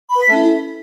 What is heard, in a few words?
Samsung Wing SMS